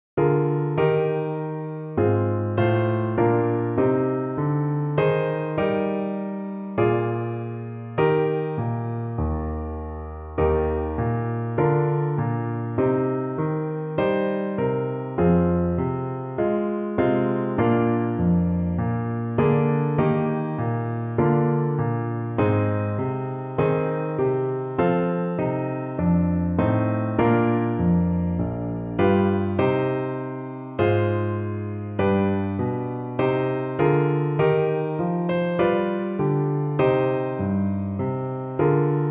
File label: Alma Mater piano